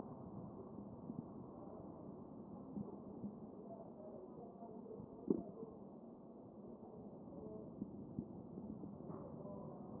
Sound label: chest